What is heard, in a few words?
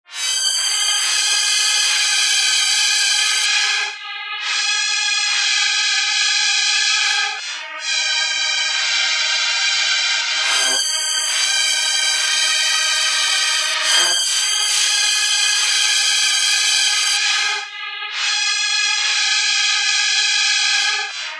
silk flute; cirrus